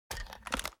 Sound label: ar reload click; weapons; akilo47; viper